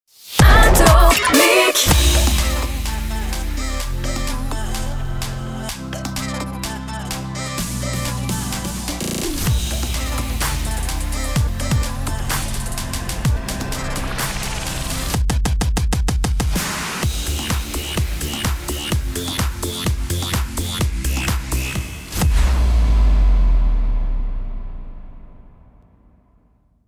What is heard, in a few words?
Jingle; Atomic Cage